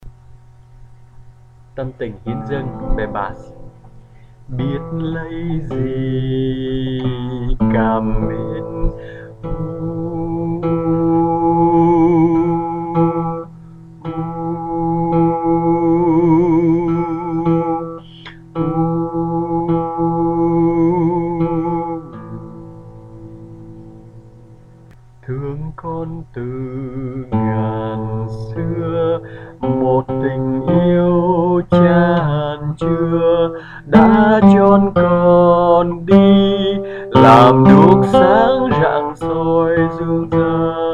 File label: Basso